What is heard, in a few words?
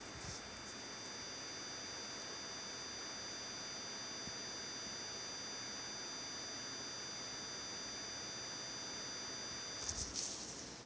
industrial hum